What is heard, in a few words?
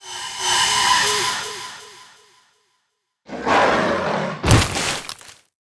Dragonneut die; Seer; GamigoNA